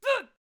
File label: Attack Vocals; Peasant